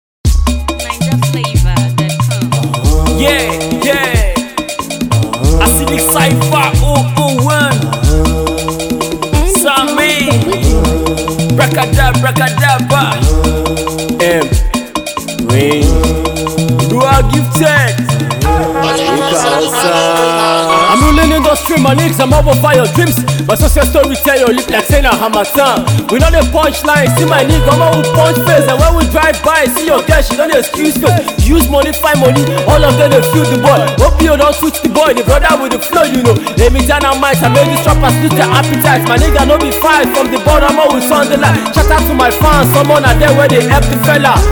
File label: Rap